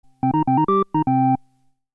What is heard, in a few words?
Hammond